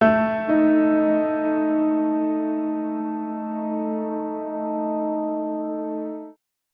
steinway piano note a min

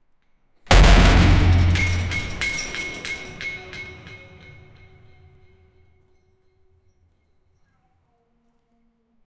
echoing car crash in a

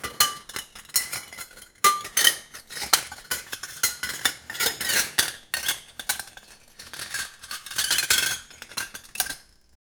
gentle utensil clinks

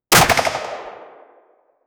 powerful pistol gunshot

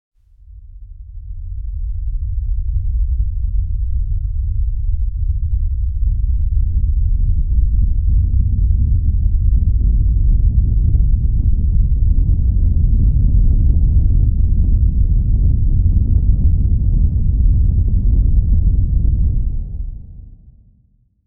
inside a rocket launching, blastoff

